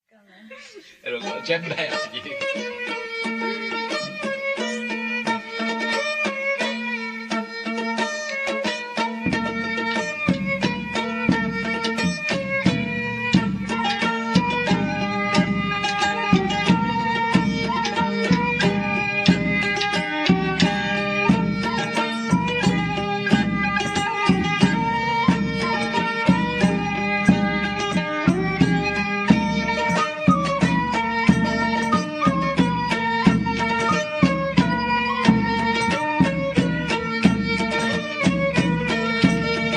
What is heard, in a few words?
breton hanter dro